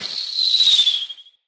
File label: Hunter Bow Ranged Arrow Projectile Start Fully Charged; Weapons; Player; Bows; EnvoyHunterBowRangedMelee